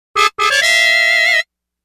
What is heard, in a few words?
Party Ball Jingle; Super Smash Bros Brawl